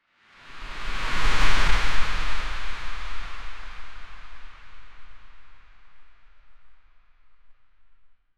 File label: a crisp fast whoosh layer, air rush